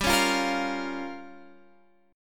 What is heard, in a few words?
G Minor 7th Flat 5th G